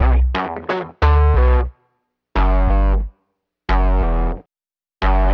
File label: guitar, Loops, Phonky